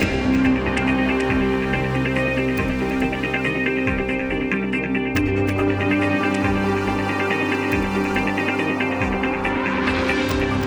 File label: Enemy Turn